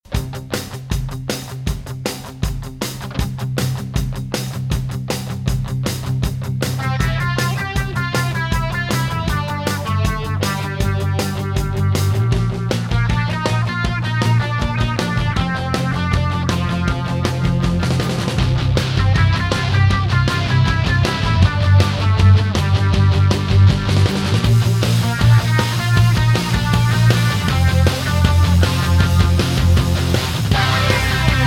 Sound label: Move to Beat